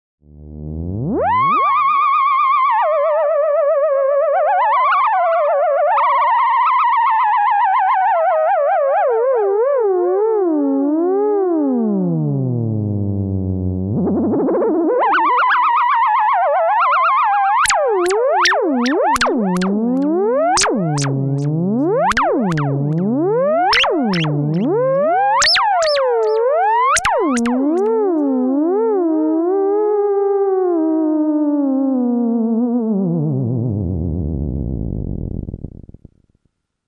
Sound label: theremin; theremin audio clip 5